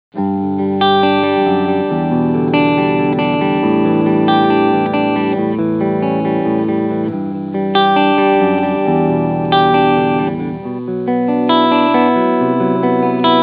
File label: Yamaha Revstar RS720B clean sound beide humbucker sc mode